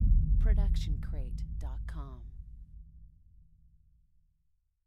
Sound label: trailer hit dark drum; soundscrate